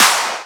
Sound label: Clap; FLPRO